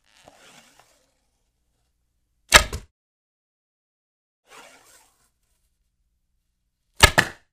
Bow, bowstring is drawn, arrow hits the target; cold weapons

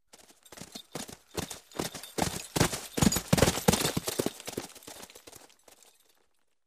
The horse gallops on the ground

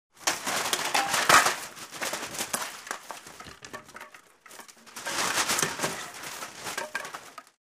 Breaking furniture, wood, blows, chips fly

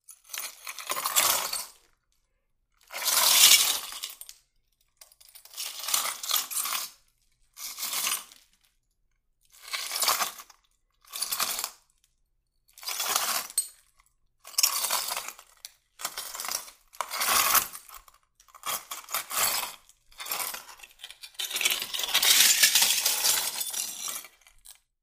Collecting broken glass

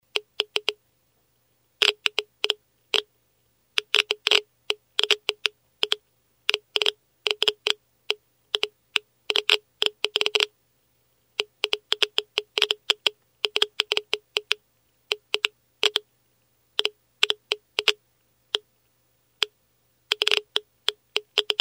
Geiger counter; radio signals

